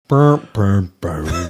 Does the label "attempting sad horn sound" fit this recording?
Yes